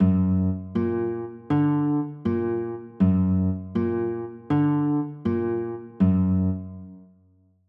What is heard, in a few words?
f sharp aug; chords